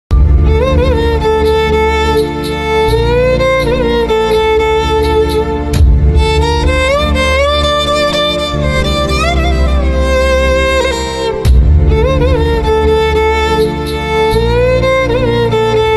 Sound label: bgm ringtone; asuran